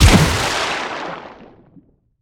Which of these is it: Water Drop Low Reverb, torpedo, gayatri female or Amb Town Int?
torpedo